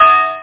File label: shooter